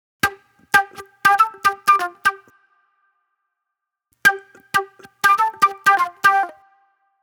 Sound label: Fast Funk Flute; Sonic Foundry (Sony Creative Software); Manhattan Flutes; The Funky Mama